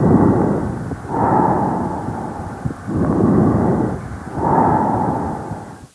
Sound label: Sfx Breathsounds Normal